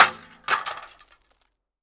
matter mayhem metal small object fall on ground; soundmorph

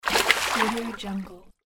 fish water splash

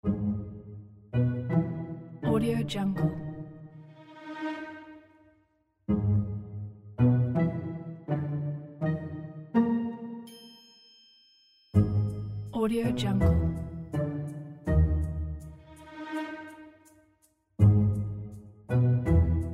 detective

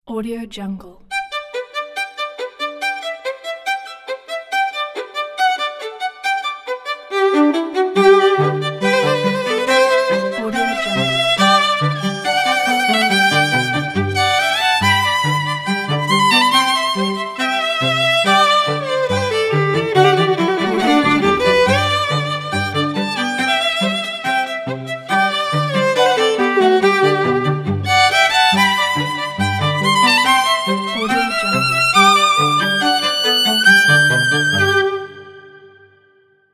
cheerful violin solo

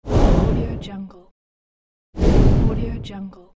whoosh

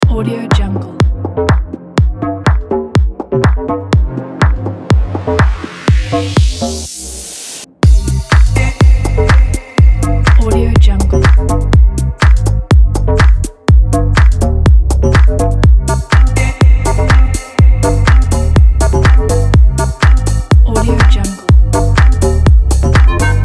club deep house

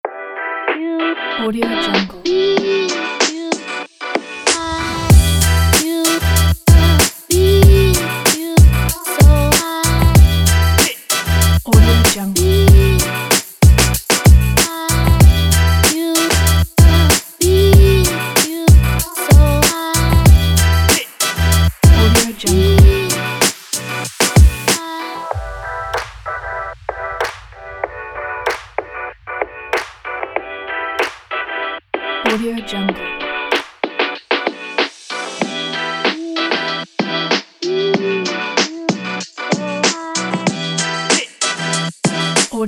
hiphop; phoenix sound

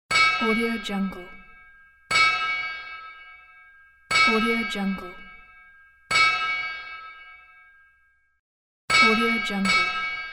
hammer hitting metal

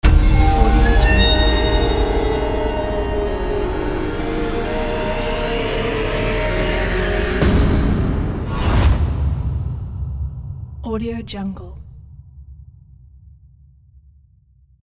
dark spooky horror logo

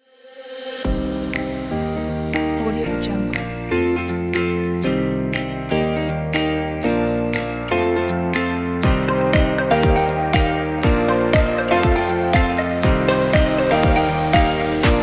happy

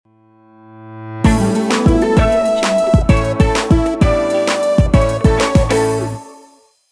corporate logo

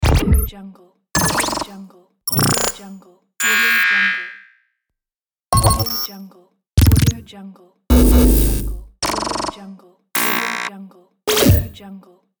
futuristic hologram negative

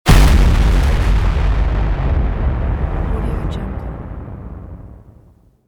explosion

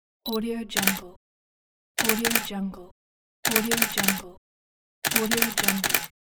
camera autofocus and shutter

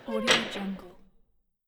old industrial door slide latch

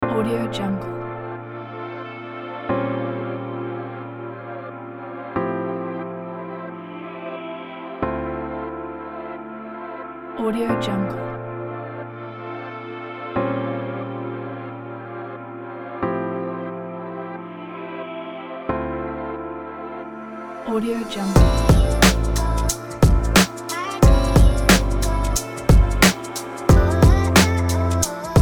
chill lofi background for vlogs

